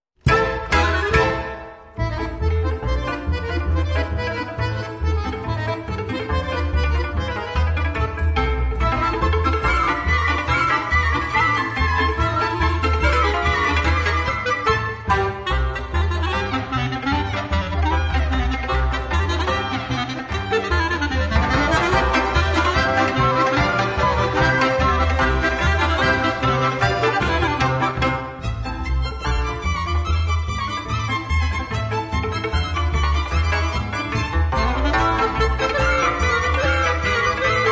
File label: polka, Ukrainian folk music ensemble